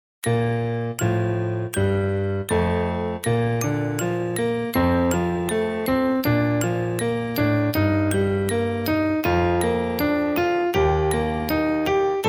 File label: Harmonized Bb Major Scale, percussion; percussion methods